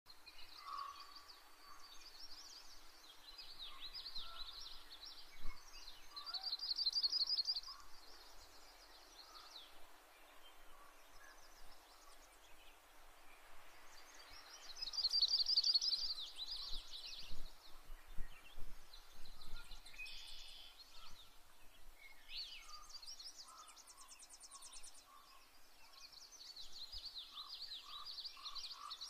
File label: Yellow rumped warbler song; the colorful nomad of the bird world